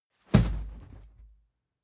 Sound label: whoosh cloth fast hard deep; swooshes-swishes